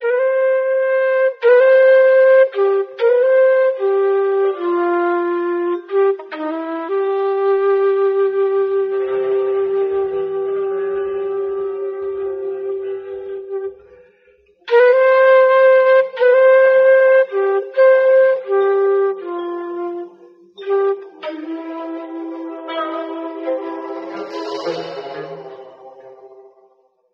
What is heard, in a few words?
flute, Sad